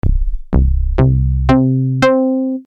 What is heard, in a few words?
dx27 solid bass; commodore amiga